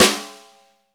snare, Roland

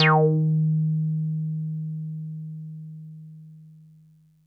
TB D#5; Music Store Sound Service; Einstein

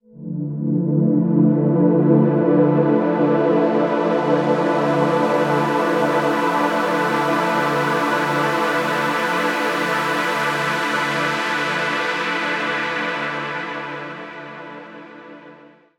Pad, Trance

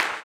CLAP, blues & soul; ILIO; Vocal Planet

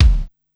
Ambi Kick, Korg Wavestation-SR, Drum Kit; Wave 455 - Drum Kit

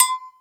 AGOGO BELL; Greytsounds VOL-1

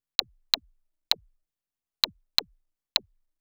Anabeat Perc; Sonic Foundry (Sony Creative Software); Prototechno

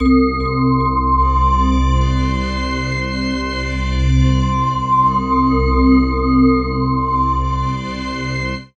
Chillout Weird Loops; Best Service ProSamples vol.36

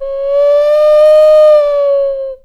bird calls, percussion

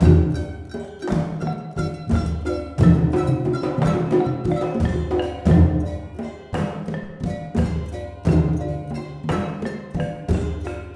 MALAY R, Heart of Asia; Spectrasonics - Heart of Asia CD2

